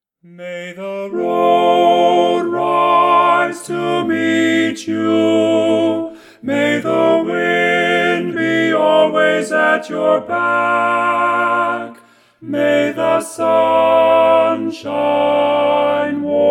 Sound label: Irish Blessing Tenor